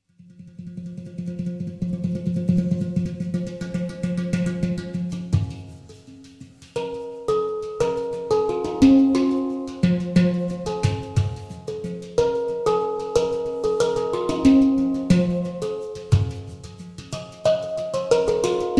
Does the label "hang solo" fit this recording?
Yes